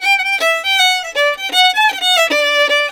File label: 160 G violin, Celtic Flavours; USB Soundscan vol.42; AKAI